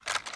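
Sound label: Reload Movement; Valorant; guardian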